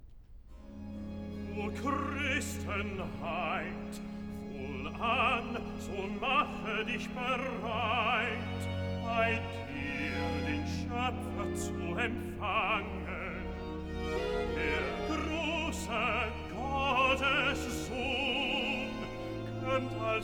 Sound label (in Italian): Recitativo (Bass); Cantata, BWV 91 'Gelobet seist du, Jesu Christ'; IV. Recitativo (Bass) 'O Christenheit! Wohlan; Bach; New York; Xmas Day + 2nd Day of Xmas